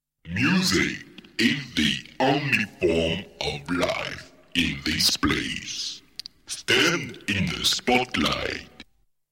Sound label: Jingle